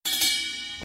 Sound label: Drum Ride